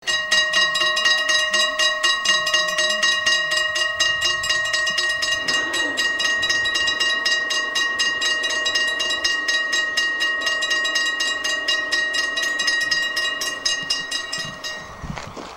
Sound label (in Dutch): overwegbel hoog